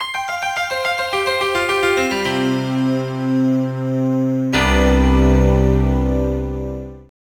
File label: Logo; JVC Logo (Contender, Victory Boxing 2); PS1